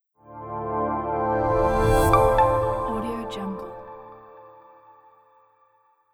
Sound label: modern soft logo reveal light